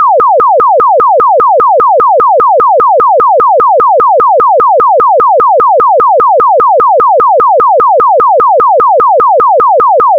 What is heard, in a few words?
chirp; chirp toys